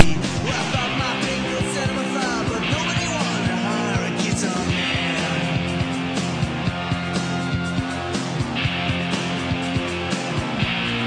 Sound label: guitar